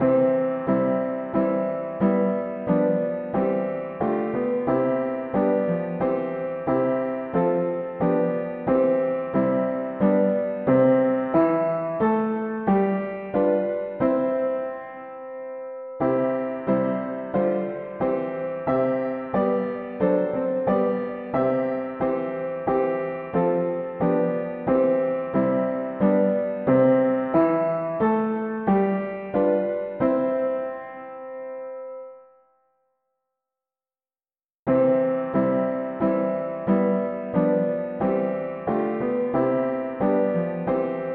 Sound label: mono piano, hymns; To wait for that appointed day